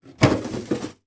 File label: household freezer compartment drawer pull out open or close; sound effects one